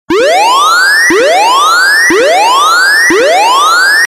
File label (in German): Einbruchalarm